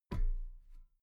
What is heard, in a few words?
metal ladder step soft muted